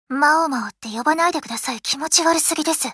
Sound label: applause